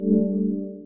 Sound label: error synth; MainIdea